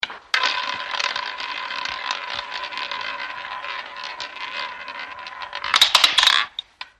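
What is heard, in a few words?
roulette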